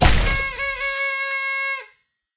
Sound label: UI Chest Party Time Open